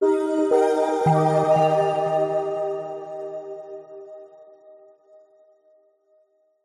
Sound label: multimedia logo musical short warm delayed soft corporate warm trusted; zapsplat